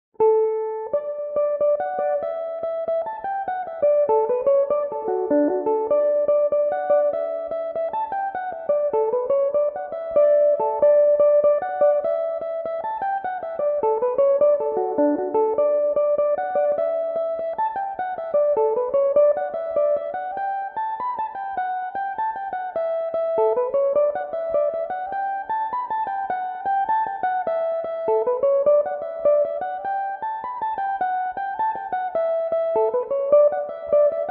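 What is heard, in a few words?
D major, Irish mandolin; mcclellans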